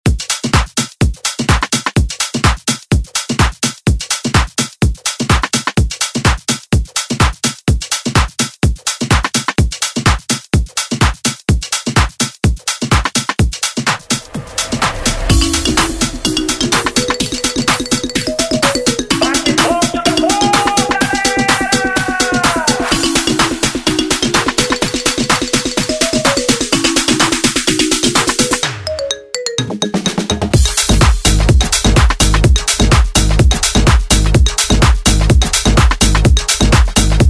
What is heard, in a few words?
House